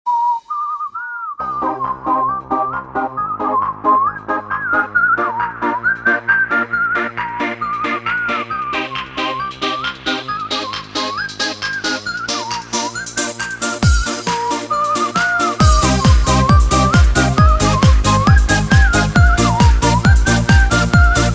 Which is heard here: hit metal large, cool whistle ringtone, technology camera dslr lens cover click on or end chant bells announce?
cool whistle ringtone